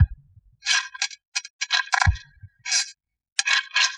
Bass Simulation Beat, Acoustic Beats; Sonic Foundry (Sony Creative Software); Myths of Technology

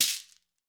SHEKERE, Latin Shakers

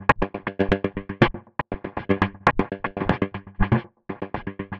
perc 100, Percussive Loops, 100 bpm; bandit

